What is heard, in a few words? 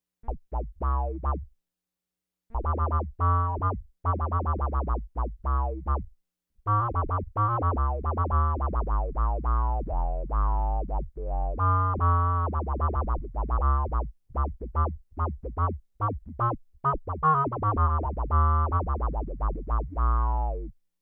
Synth Autowah; Pitch Manipulation Pedals